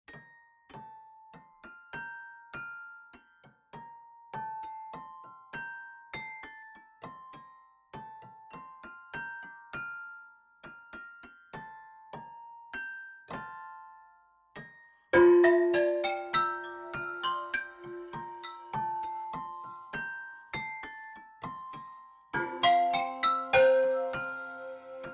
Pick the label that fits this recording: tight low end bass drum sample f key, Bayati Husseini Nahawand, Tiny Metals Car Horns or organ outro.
Tiny Metals Car Horns